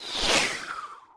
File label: Arrow Pass; SFX Kit; Foley - Misc